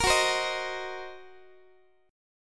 Gb 6th Flat 5th Gb, Guitar; Gb6b5 strummed

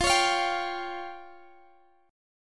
C sharp Major Flat 5th, chord

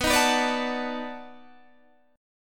B 7th Sharp 9th, down, Guitar, Open G

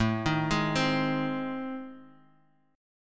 A Minor Major 7th Flat 5th, Guitar Half Step Down; AmM7b5

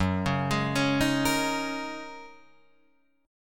F sharp 6th Add 9th F sharp Guitar Drop D

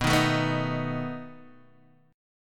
A Suspended 2nd Flat 5th B, guitar

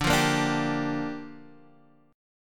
G Minor 7th, D

